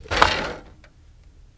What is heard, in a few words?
Bicycle Drop Clank